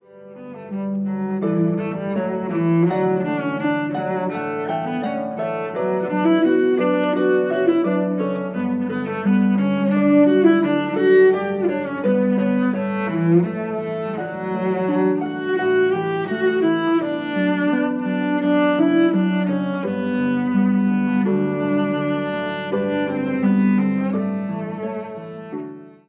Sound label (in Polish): Kanon Pachelbela